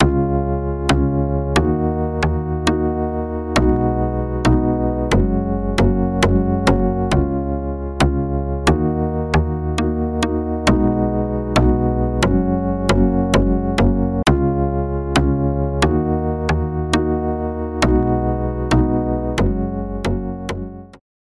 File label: Moog Little Phatty MoogPerc; Bassesland; Virtual Acoustic, Electric and Synth Bass KONTAKT Sample Library for NI KONTAKT; Syntheway